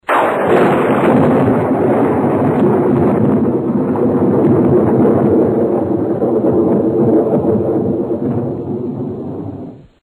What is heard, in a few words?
Thunderclap; Halloween